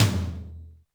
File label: Yamaha RM 50 Toms